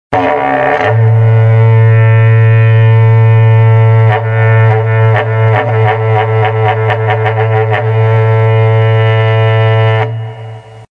repiques de berrante; berranteiro

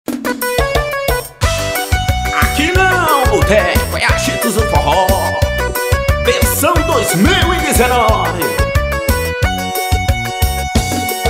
forro

